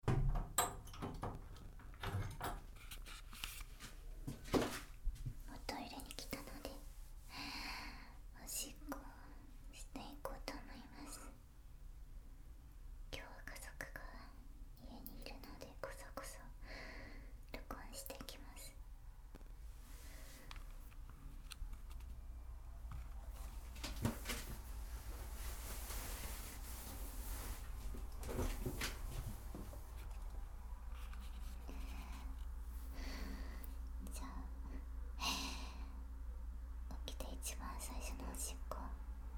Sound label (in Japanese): おしっこ; 9日目のおしっこ; 寝起きおしっこ11日分